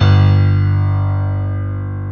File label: AC PIANO; PIANOS+KEYS; Pro-Rec - Synthsonic